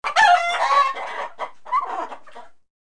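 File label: chicken coop, buildings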